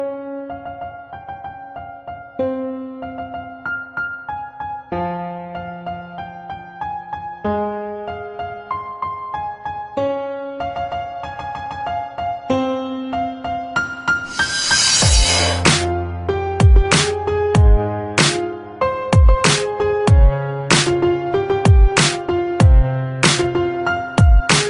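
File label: CREEPY INSTRUMENTAL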